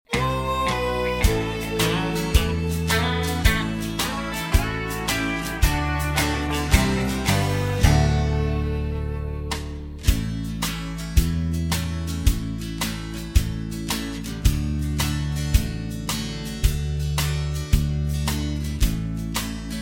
D No Backing Vocals, backing tracks

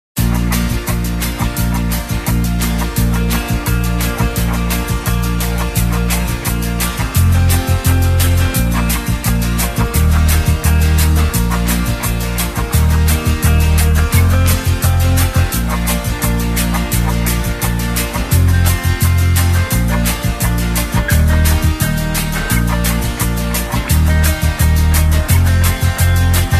No Backing Vocals

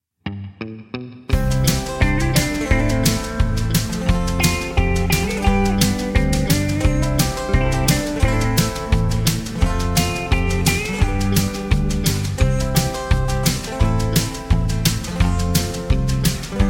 (C To D) No Backing Vocals

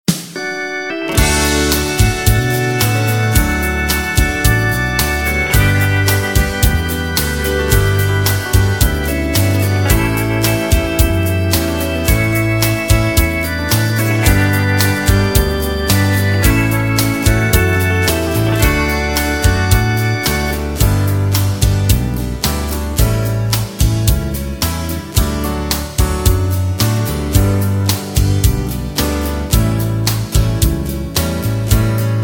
(D) No Backing Vocals

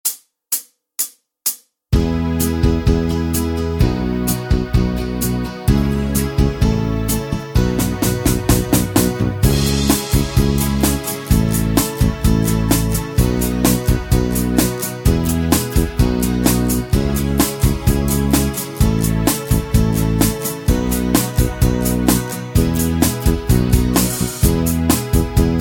No Lead Guitar

